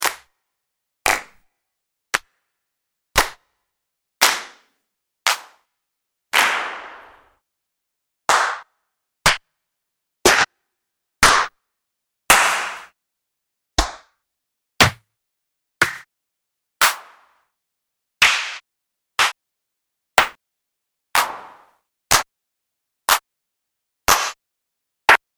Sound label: Clp and Cym and Hit; fantom-x8; patch demo